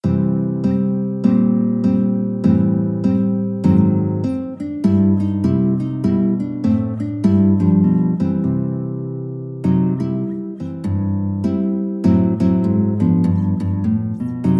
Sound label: western blues, guitar